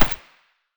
scar silencer mono distant

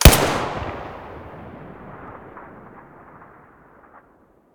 tt33 muzzle stereo

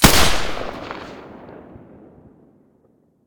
silencer g36 mono, weapons

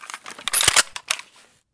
bizon misfire

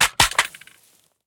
riffle drop grass; STALKER; IWP

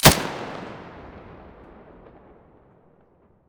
muzzle stereo

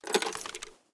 pkm draw

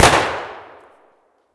saiga muzzle mono distant, weapons

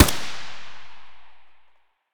ak74u muzzle mono distant, weapons; STALKER; IWP

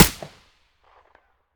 aps silencer stereo; STALKER; IWP

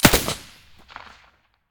sa58 silencer stereo

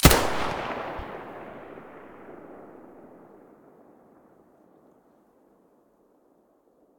muzzle mp9 stereo, weapons

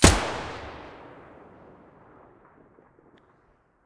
vihr muzzle mono, weapons

